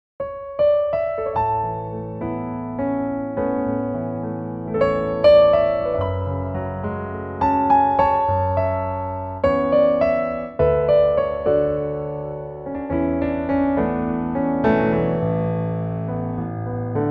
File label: piano A to Bb; vocal range - F# to C